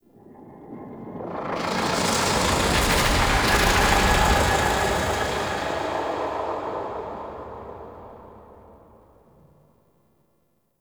Pulsating Whoosh; Warframe; Ambience; Entrati

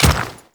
Dirt Step; Enemies; Footsteps; Warframe; Grineer Royal Guard

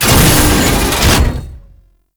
Tank Turn Half; Enemies; Movement; Lasrian Tank; Warframe

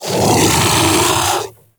Kubrow Armored Vocal Alerted; Warframe

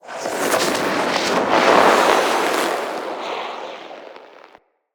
Arrow Barrage Whoosh; Level Events; Midrath; Warsong Prologue

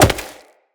Wall Jump Rock; Tenno; Footsteps; Wall Run

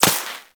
Laser Impact Metal; Warframe

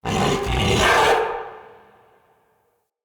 Battle Dog Enemy Spotted, Animals; Vocals; Snc Battle Dog

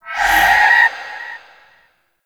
Void Meter Full; Weapons; Warframe; Zariman

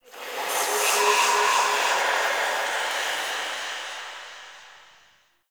Zariman Whisper Air Draft

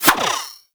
Skip Rope Whoosh; Warframe; Characters; Pets; Moa; Tenacious

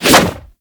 Whoosh, weapons

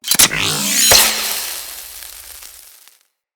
Remove Bolt Zap; Tutorial; Tenno

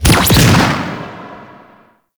Tripwire Ability Deactivate; Warframe; Venus; Venus Commander Aqua; Abilities

